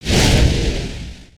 Rocket Ship Fire Burst

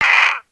Disapprove, creatures pets

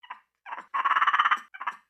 Pets Skunk Idle Short; creatures; Lego

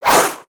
Spear Swing

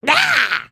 Air Fall; creatures organic; Lego; Strombie

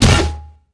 cannon jump

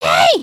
wave, emotes

